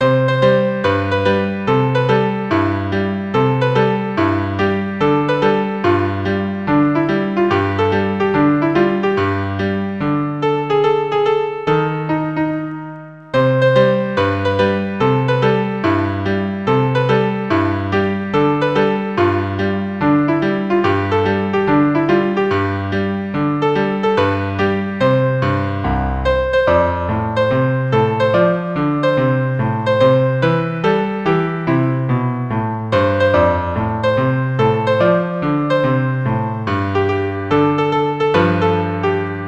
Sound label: longer piano arrangement